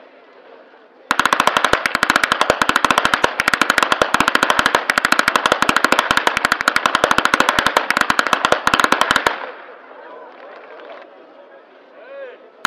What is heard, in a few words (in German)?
schnalzen, flachgau